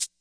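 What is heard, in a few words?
classic hip hop shaker; STYLE KITS (Hip Hop, Etc); Classic Hip Hop Mega Kit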